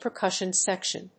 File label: percussion section